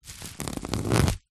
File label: foley knife serrated cut bubblewrap